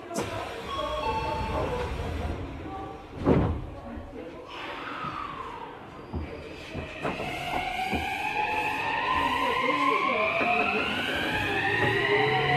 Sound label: vvvf, mitsubishi